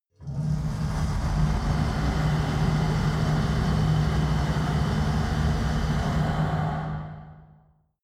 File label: mechanic ambience